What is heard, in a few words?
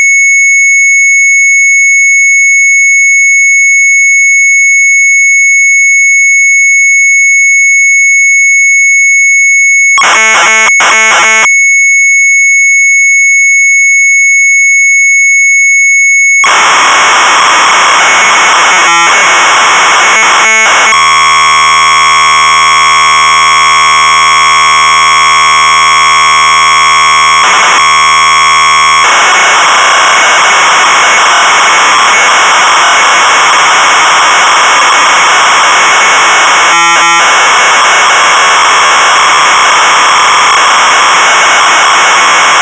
MZ800 Tape, sharp; PASCAL COMPILER; retromelsarchive